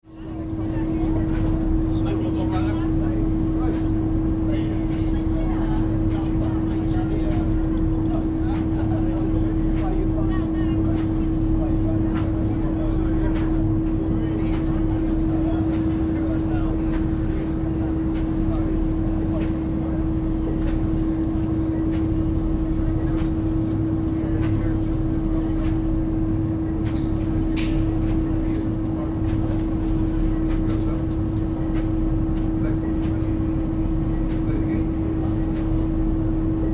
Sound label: Ferry On Deck, Boat, ferry, riding onboard ferry in Amsterdam - outside, on deck; sound of ferry engine and light crowd chatter; Boat Sound Effects